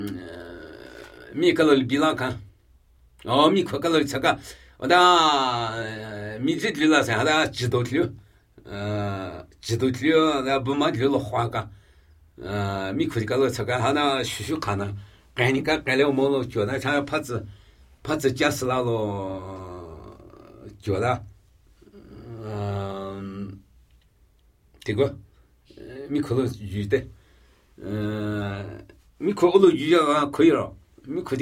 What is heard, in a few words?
Namuyi Tibetan Ritual Chanting